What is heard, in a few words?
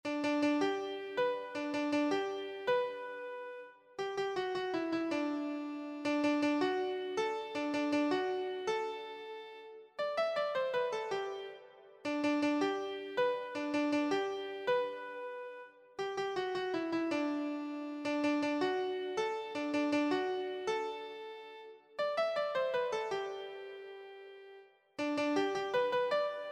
la cucaracha keyboard